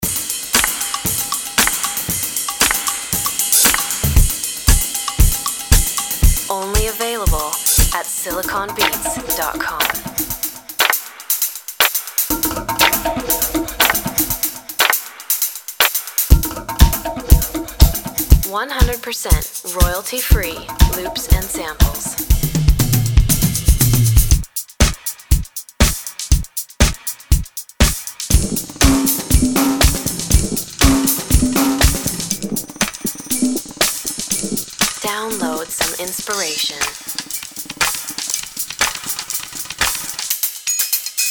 disco drums voice duck

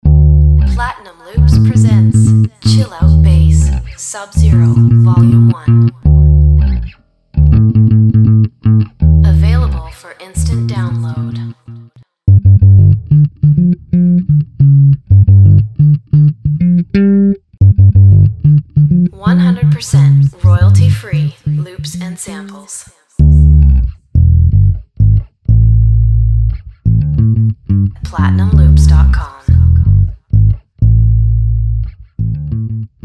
chill out bass subzero

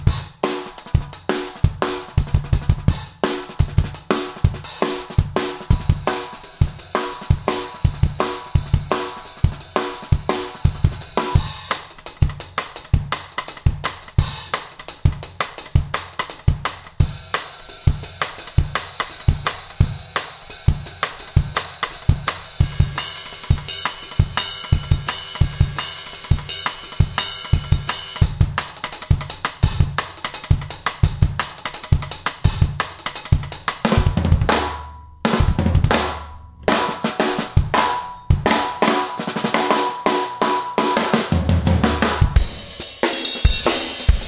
acoustic jungle

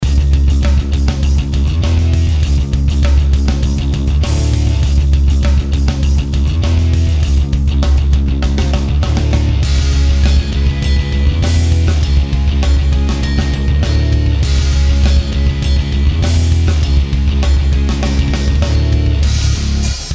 mega metal

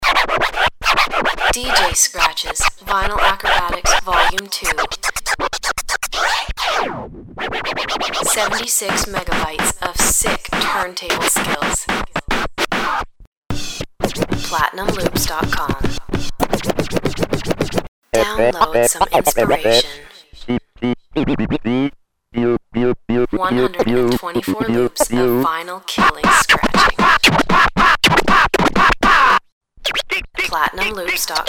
dj scratches vinyl acrobatics

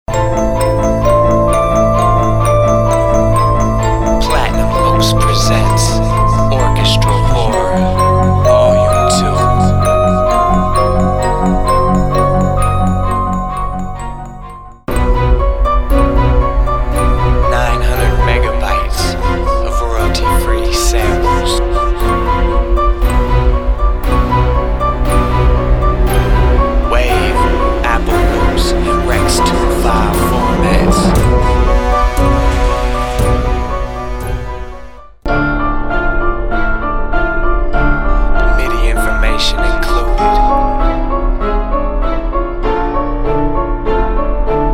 orch horror

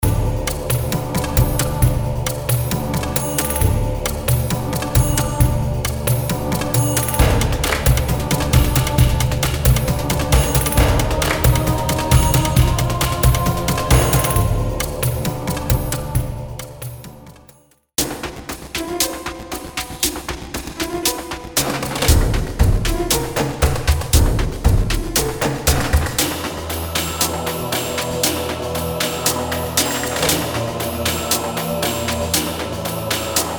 cinema drums

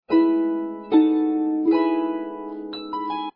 end times, harp

